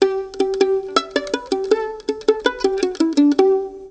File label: violin pizzicato